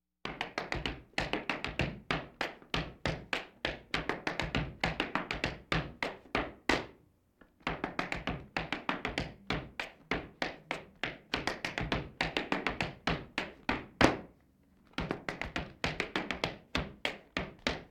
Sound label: Laugh tracks